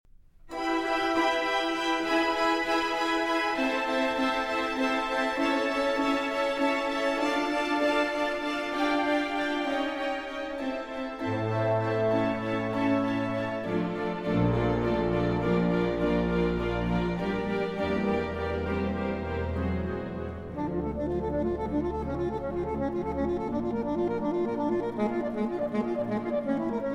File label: Concerto For Violin and Orchestra, For Saxophone, Crotchet = 104